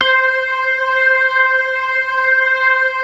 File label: ORGAN 4 DRAWBARS; Keith Emerson Signature Series